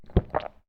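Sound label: consume food swallow masculine; consumables; food-and-drink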